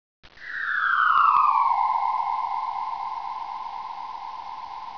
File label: wind